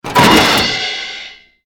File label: Metal Joint Break; Bad Piggies